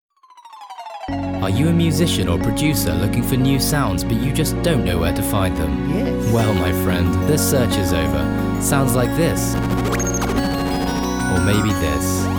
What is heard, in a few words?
Jingle